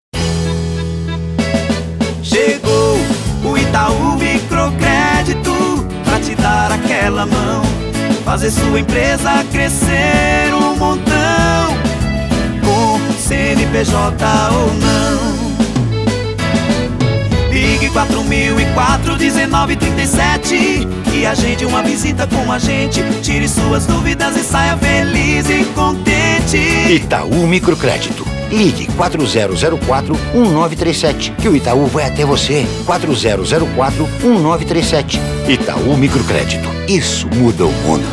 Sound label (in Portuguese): JINGLE CARRO SOM; ITAU MICROCREDITO